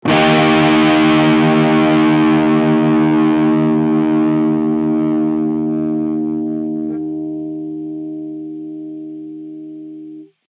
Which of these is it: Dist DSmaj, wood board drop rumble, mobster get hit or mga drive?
Dist DSmaj